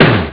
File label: Pop Snare